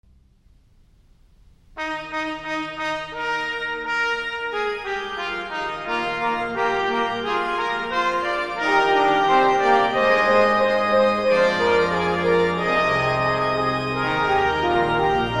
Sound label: Short Preludes For Organ, E-flat Major, Brass